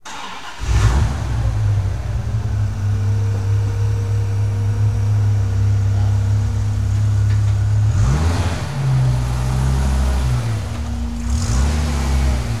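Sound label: Viper GTS Start Pull Away Interior; Automobiles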